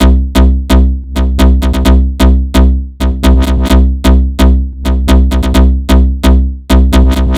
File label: Beat Loop; DF KIT